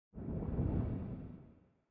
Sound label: spin Button Up Anim; goldengrimoire